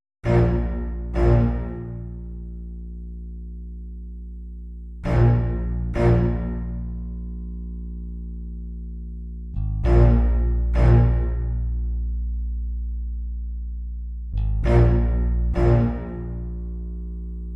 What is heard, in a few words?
Bass And Deep Accents